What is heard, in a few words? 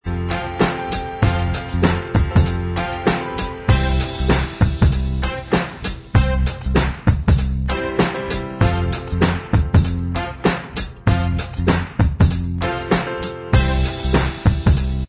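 instrumental track, backing tracks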